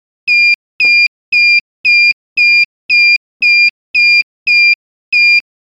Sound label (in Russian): Ожидание записи мастера повторяющийся сигнал